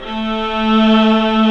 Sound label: VIOLA, 3D Pads; E-MU Producer Series Vol. 5; 3-D Audio Collection; 3D Multis